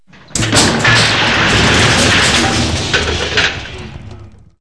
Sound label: Big collapse